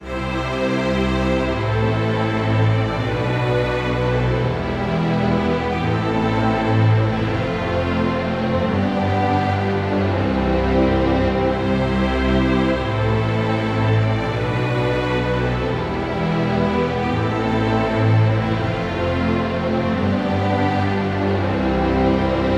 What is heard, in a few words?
strings